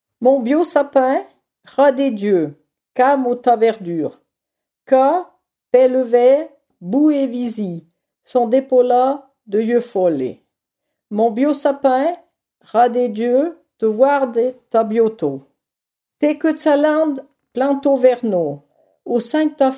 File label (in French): Mon beau sapin